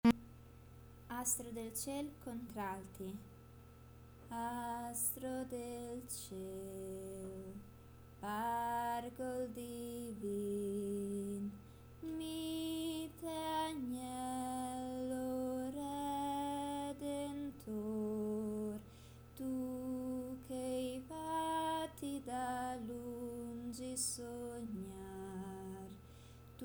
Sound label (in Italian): contralti; astro del ciel